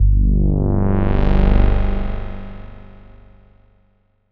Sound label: Bass Stab, 120bpm, G; 80ST Zenhiser